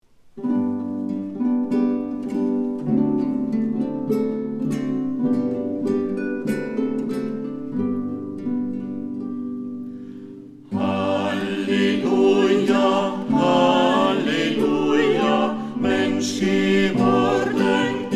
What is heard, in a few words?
Halleluja